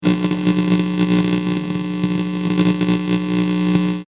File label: Electric Interference, Stuttering, broken electric buzzing; electricity; Electrical Sound Effects